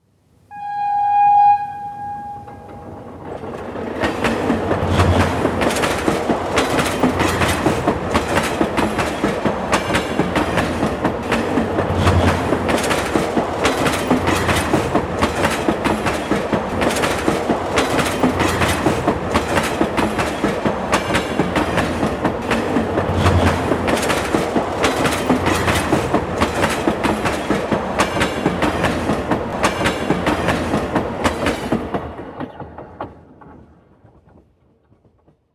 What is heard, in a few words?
train railroad traffic sound